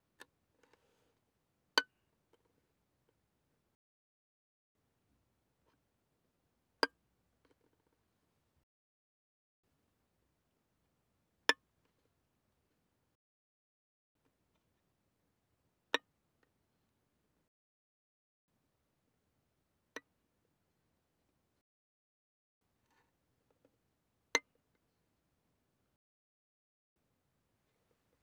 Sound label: Violin pizz mf sul E Gb6 Gb7